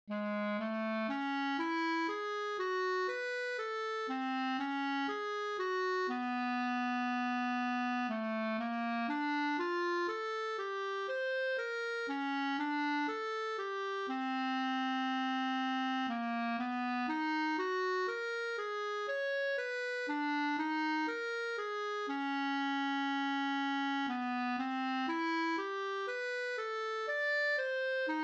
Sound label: melodic ii V I sing along